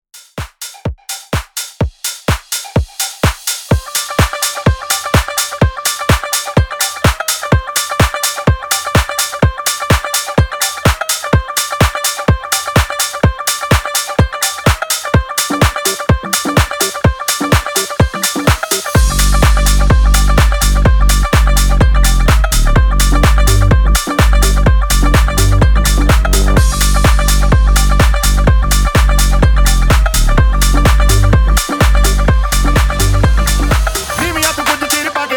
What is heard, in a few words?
HOUSE 126 BPM